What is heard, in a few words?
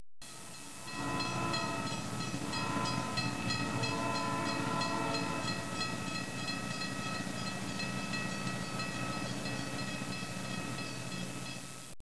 train